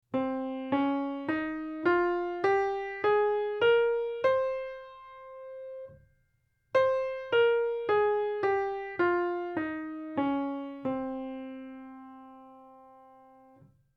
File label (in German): Phrygisch